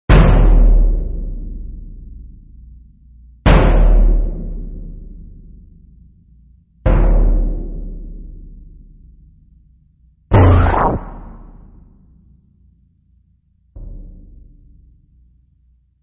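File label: Cues, Giant footsteps, instrumental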